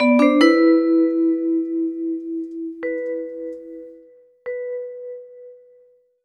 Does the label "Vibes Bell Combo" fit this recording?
Yes